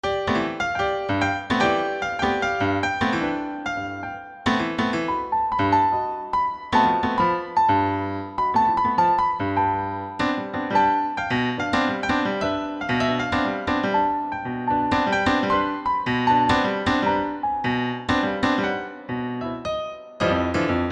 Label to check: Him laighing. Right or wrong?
Wrong